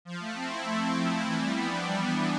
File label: Synth